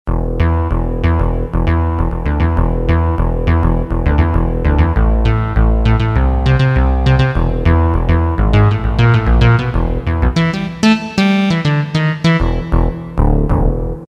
SynBass1, Casio CTK-601, Tones

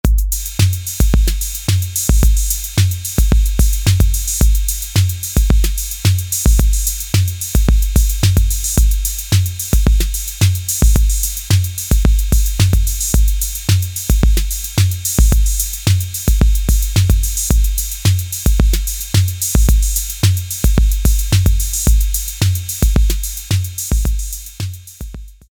ROCK, Roland TR-808; Factory

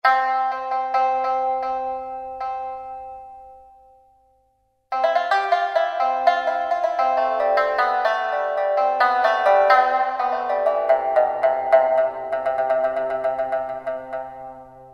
PIPA - Chinese Mandolin; FRETTED INSTRUMENTS; Spectrasonics Heart Of Asia

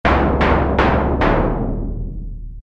Timpani, Roland Juno-106; Factory Preset

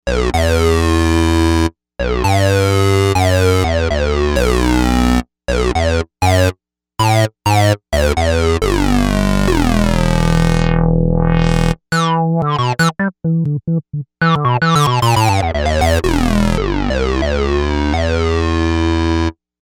Sync, Novation UltraNova; Factory Patches

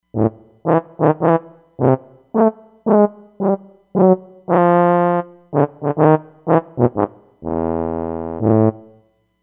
Tuba

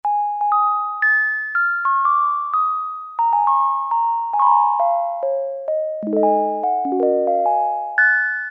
Toy Rhodes, Roland Juno-106; Factory Preset

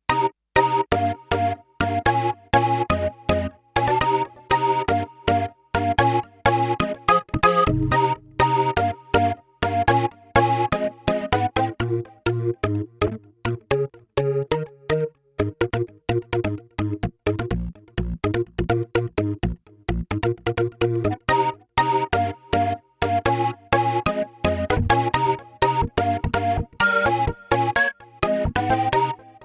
Kawai K5000S

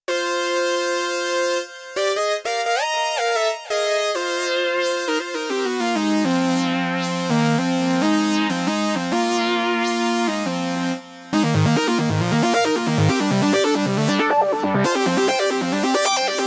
Take The 5th, Korg Radias-R

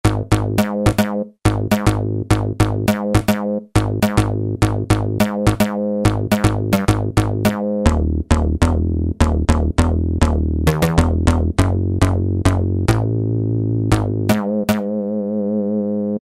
Minibass, Kurzweil K2000, BASS; Kurzweil K2000 Contemporary ROM; Contemporary ROM Programs and Demos diskette

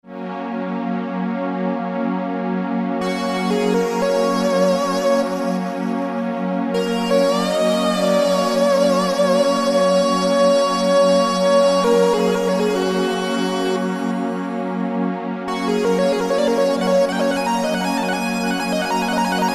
Lead & Pad, Korg N364; Factory Combi demos

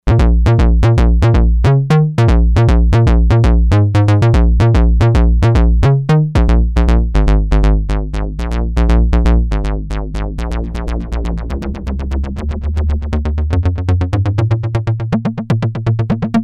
Acid Mono Bass

